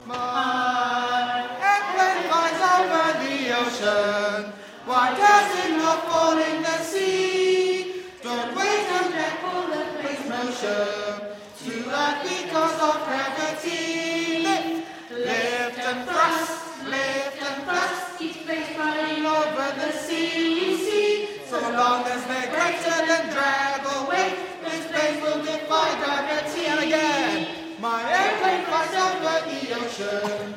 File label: singing; singing history concert 2016